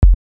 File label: clean kick